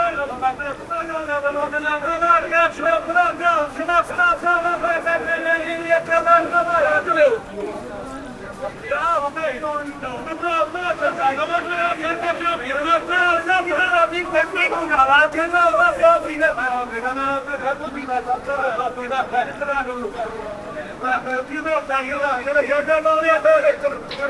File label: arabic market people